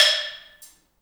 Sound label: balambo, balafon; Heart of Africa